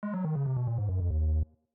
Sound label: game Over